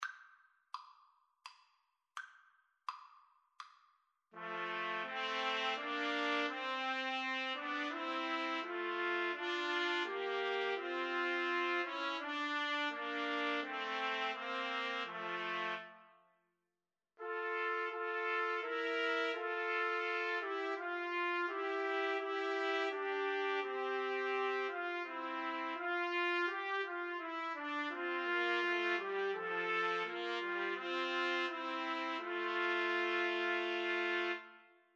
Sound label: my country tis of thee, Trumpet Trio